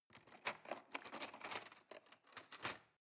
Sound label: cutlery cake fork drawer handle long